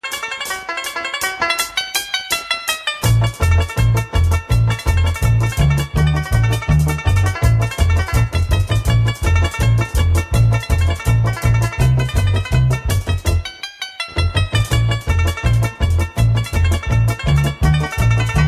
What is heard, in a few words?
Bluegrass; Кантри; Афроамериканские стили